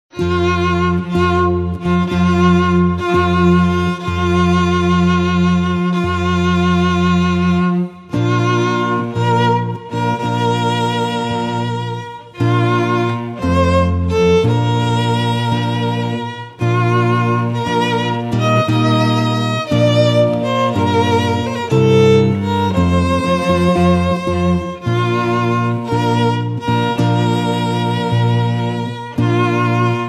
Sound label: BRIDAL CHORUS TRIO